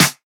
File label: treble heavy steel snare drum sound D key